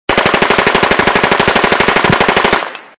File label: M4A1